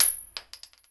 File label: pl shell; xash3d fwgs